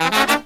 LOW HIT, Brass

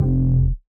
Chorus Bass, Old Skool House; Big Fish Audio; OSH Bass

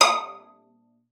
VIOLA FINGER; Xsample VOL-5

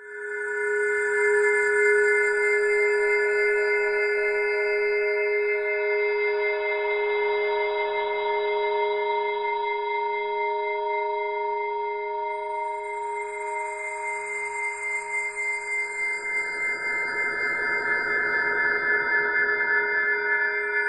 Ominous Drones; USB Soundscan vol.48; AKAI; UBOOT

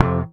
SHRT F, Bass; Sampleheads; Will Lee Bass Library

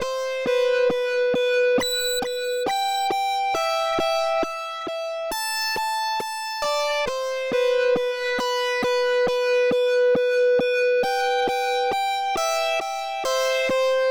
Dubwyzz Keys, Indie Rock; Sonic Foundry (Sony Creative Software); Indie Rock Anthems; Session 04 - Dubwyzz

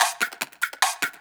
LOOP EQ, Drum & Bass, 150 BPM; Ueberschall; Drum & Bass Resonance

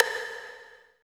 STIK + RV; East West - Phat & Phunky CD2; 90's Sample CDs

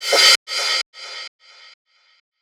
Gated Cymbal; creative gate; One Shots; musicradar

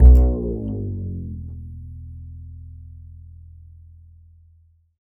Upright Acoustic Bass; 1ST POSITION; Larry Seyer

